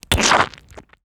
creature crab organic texture carapace crack squishy; Bluezone Corporation